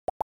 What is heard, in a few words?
pop